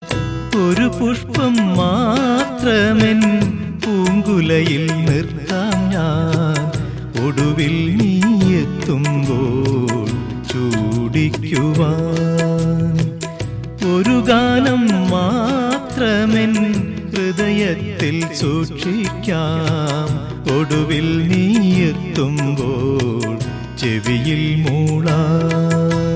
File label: Old Hits Malayalam